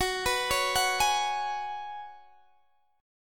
Gb Suspended 2nd Suspended 4th, Guitar DADGAD

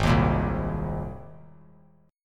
Ab Major Add 9th Ab; Abadd9 strummed; JGuitar

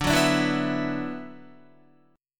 D 6th Add 9th, Guitar; D6add9 strummed; 10,9,12,9,11,11; tuning 4E,4A,5D,5G,5C,6F

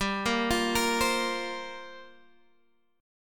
F Suspended 2nd Suspended 4th G

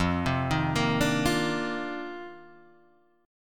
F 13th Suspended 4th F, chord

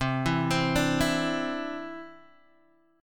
C-Major Add 9th, Guitar Open G

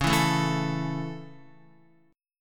Db Minor Sharp 5th Db down Guitar

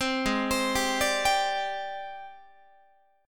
Ab Major 7th Flat 5th Ab, down, Guitar; AbM7b5 arpeggiated

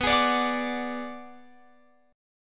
B Minor 6th B, Guitar Open F; Bm6 strummed

